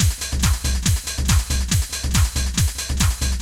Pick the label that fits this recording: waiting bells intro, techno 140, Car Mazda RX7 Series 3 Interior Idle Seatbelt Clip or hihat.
techno 140